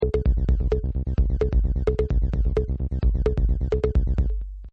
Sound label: Synth 303; Buttons; Multimedia; Partners In Rhyme